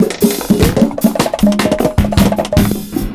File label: ONE DROP, 76 bpm, Breaks; POTBreaks